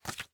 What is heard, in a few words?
Drop Card; Game rip; MiSide